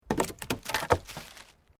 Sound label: Wood Logs Movement Major